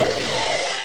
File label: Soldier Pain